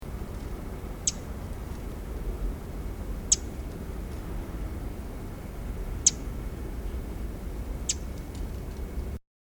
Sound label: Pacific Wren